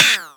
Percussions

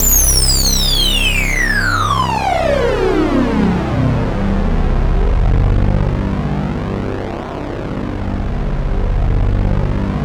FX; Masterbits; Vintage Voltage

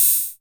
hho, Korg KR-55B

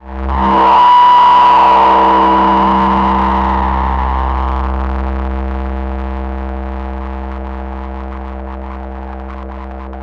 pad C2, Korg MS-20; Pads; Polyend; Simulacrum Sounds; 1 Shots; Synths

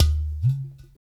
UDU, African

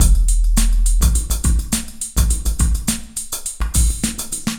HUM DRUM 104, Dance Trax loops

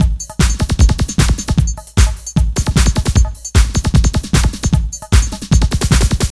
loops 140 bpm; Masterbits - Tekkno Trax

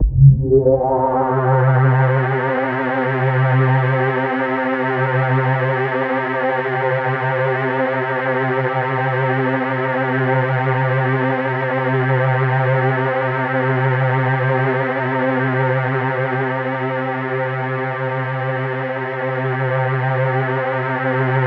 C2, Synth Line

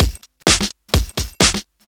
Straight Loop 128 BPM, House

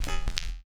SFX, Drum & Bass 170 BPM; Ueberschall; Drum & Bass Flash Trackz

